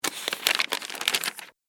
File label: Paper Movement; Paper Sound Effects; office sound effects